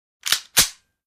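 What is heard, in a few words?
Handgun 9 mm Cock Single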